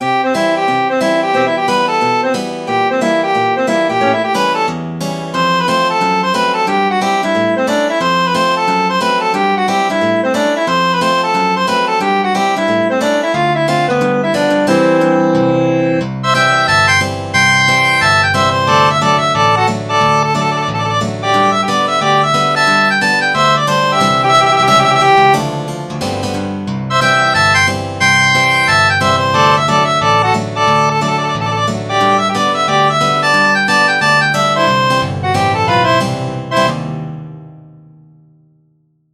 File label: Remix; SMB2